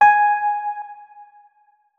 notes, keyboard, electric piano

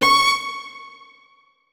notes, keyboard strings; Hideout Furniture

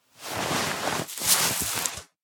light, Vaulting; ledge grabbing; Ledge Climbing Mantling

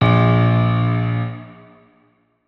notes, keyboard piano; Hideout Furniture

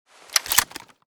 mossberg reload, weapons; MRAA + Blindside Military Pack

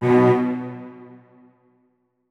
notes, keyboard; Hideout Furniture

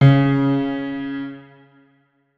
notes, keyboard piano; Hideout Furniture

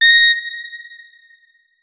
notes, keyboard saxophone; Hideout Furniture

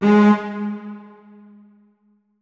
notes, keyboard; Hideout Furniture

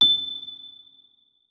notes, keyboard, electric piano

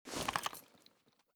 mossberg holster, weapons; Mask's Reanimated Anomaly Arsenal

